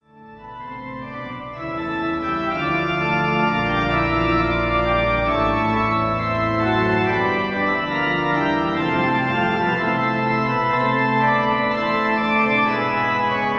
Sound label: Sweelinck Chromatic Fantasia, for Organ; Jan Sweelinck Chromatic Fantasia for Organ